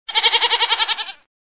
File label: Billy Goat